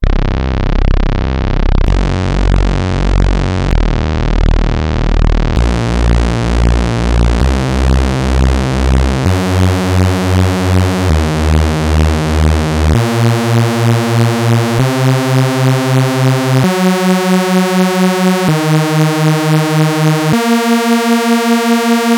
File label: expand detune +7 single OSC